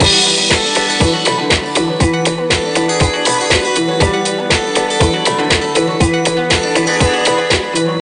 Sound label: Music Dance